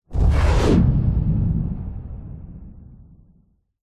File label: vehicles plane fighter jet sonic boom designed